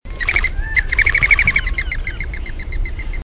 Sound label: pigeon dove